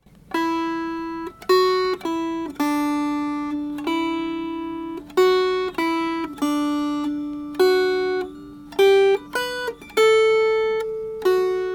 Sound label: Melody; My Passion Is As Mustard Strong